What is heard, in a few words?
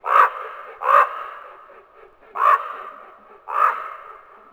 raven